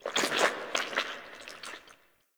Digest Interior Medium, Ambience; Warframe; Sentient Lair

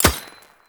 Kinetic Plating Shield Hit; Warframe; Runner

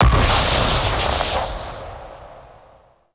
Void Sink Appear; Void Storm; Railjack

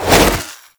Melee Whoosh; Warframe; Sentients; Sentient Trooper

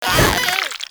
Infested Runner Jump Whoosh E; Enemies; Abilities; Warframe; Proto Infested

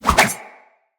Pole Arm Spin Whoosh; Weapons

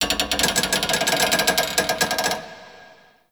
Metal Creak, Ambience; Warframe; The New War; Zariman

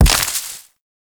Mech Step Surface Foliage; Vehicles; Step Surfaces; Warframe; Nechro Mech

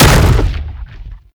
Rupture Pillar Burst; Roathe; Warframe; Tau; Abilities

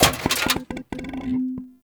Vending Machine Bottles; Warframe; Ambience; NineteenNinetyNine

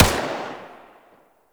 Shot, Rifle; Warframe; Eidolon; Rifle Lancer

